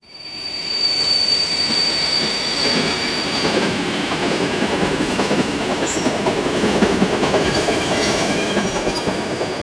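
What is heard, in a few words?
a clean train whistle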